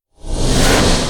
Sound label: ability shadowstrike cast